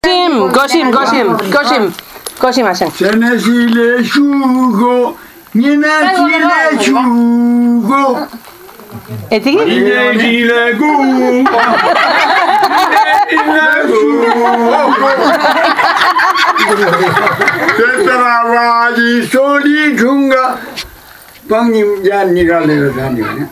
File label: Singing; Nepal